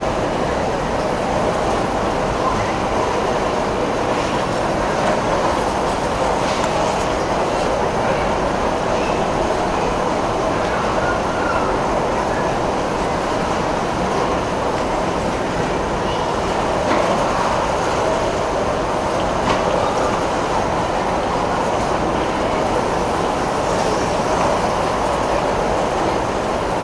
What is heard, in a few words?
ambience airport stansted baggage reclaim; sound effects one